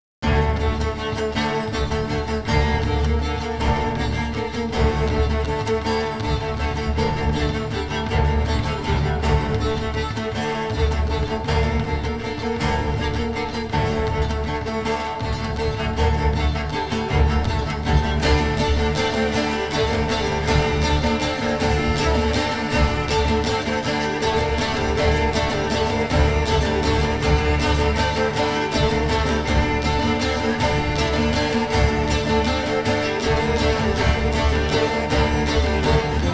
Combat Loop Layer; the witcher 3 wild hunt gamerip 2015

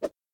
sword

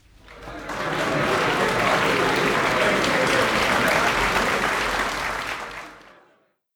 crowd medium outro

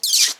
rat squeak; Devour

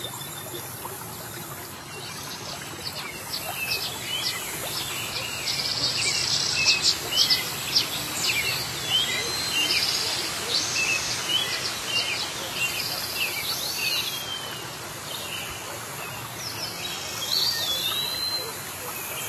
Greenfield Ambience; Rumble Stars Soccer; android

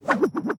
Whirl; portal quest; android; Paladin Skill1

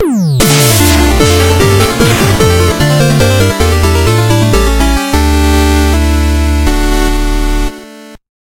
Music Card Lose; Shovel Knight King of Cards

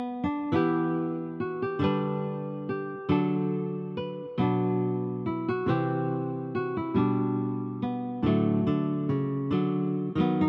Playing the Lute; betrayal at krondor

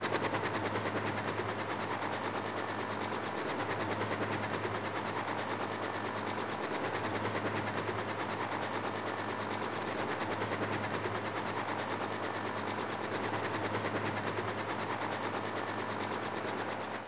Helicopter Idling

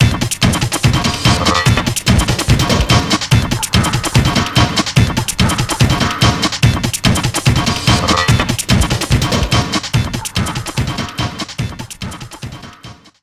Beat Only; rayman arena; Factory

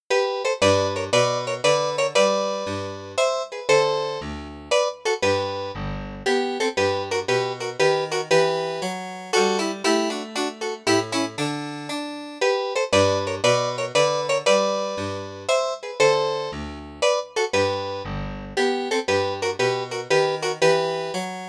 Minuet G; Babyz

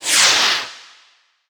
Fireworks Fly; angry birds rio

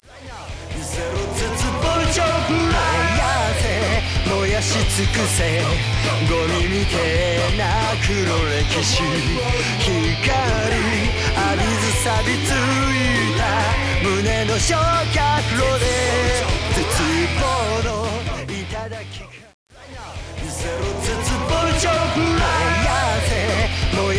karaoke bgm

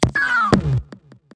death

